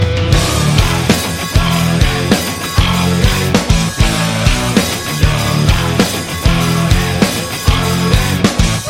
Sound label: funk